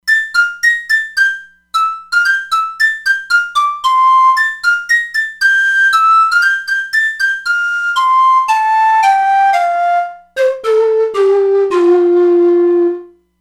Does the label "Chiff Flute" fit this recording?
Yes